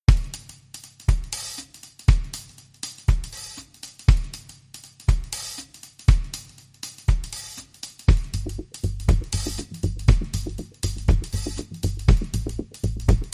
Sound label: Drum and Bass